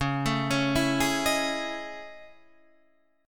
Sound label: A Major Sharp 11th, Db